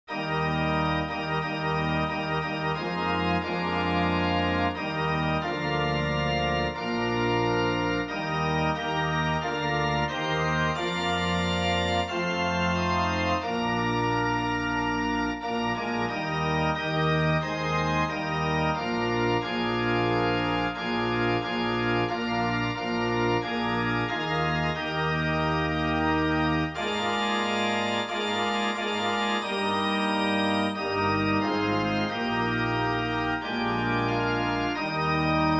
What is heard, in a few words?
gloria patri organ